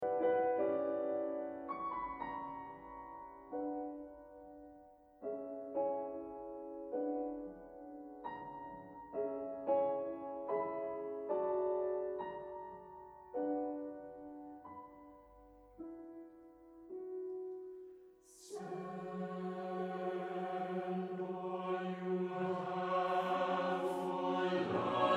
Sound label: Sing to me windchimes